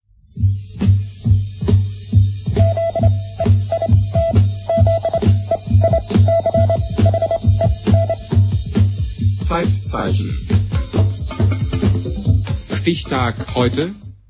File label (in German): zeitzeichen; wdr2